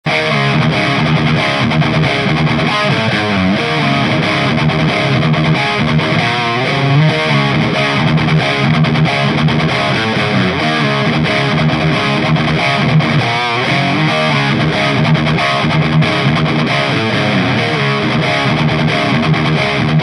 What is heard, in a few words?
Modded TSL Chrunch Channel; Dan Hopler